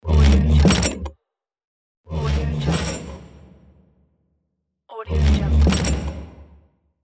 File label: closing an old door